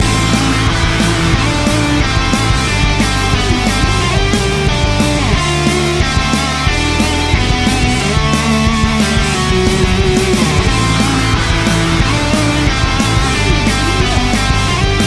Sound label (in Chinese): 游戏 title 主题曲